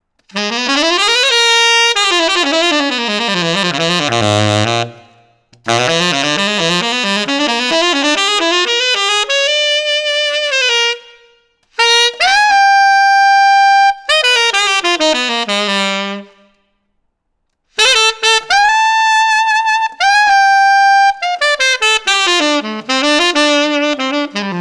Alt Reverb, tenor saxophone

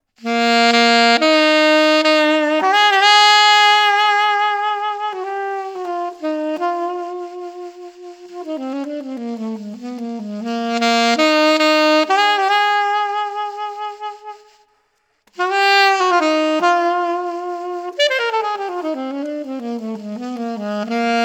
Alto Reverb, alto saxophone mouthpiece; js custom aura 7 alto saxophone mouthpiece

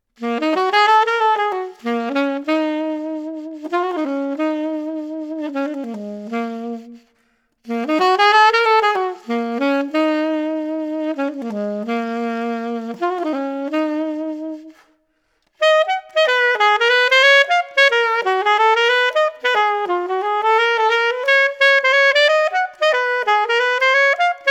Alto Reverb, alto saxophone; js custom nova 6 alto saxophone mouthpiece

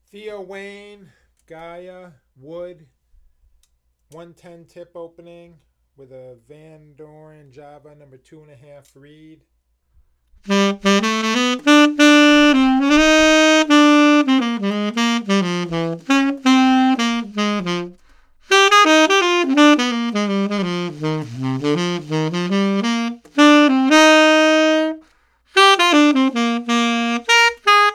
Wood Tenor; TW Gaia

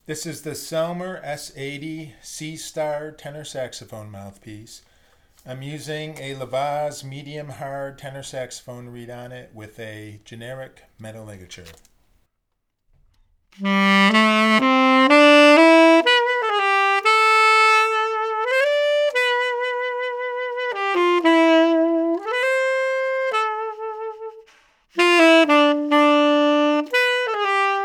Selmer S80 C Star, tenor saxophone mouthpieces

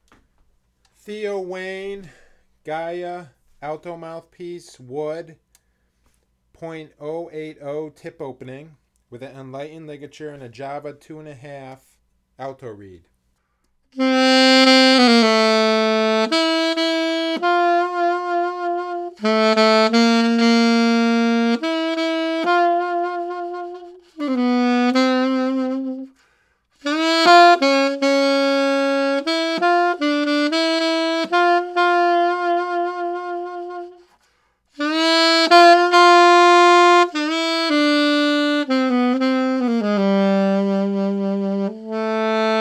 Gaia Wood Alto

